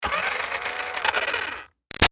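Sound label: Toy Bounce Back Racer close up Run Short; Toy Cars; Toys; Big Room Sound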